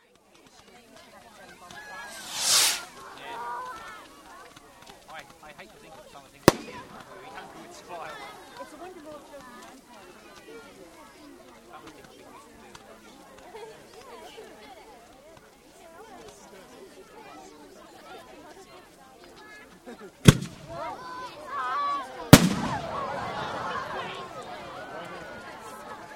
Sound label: Fireworks Crowd - Odd rocket and bang - crowd reactions; Tom Hutchings